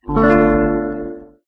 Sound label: battery low